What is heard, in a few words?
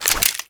pickup ammo